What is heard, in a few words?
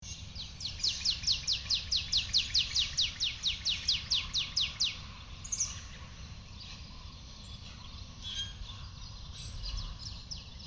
chirping